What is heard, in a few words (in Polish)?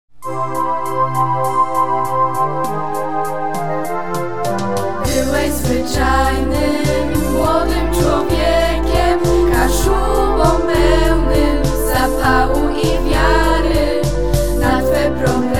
Hymn szkoly; Swarzewo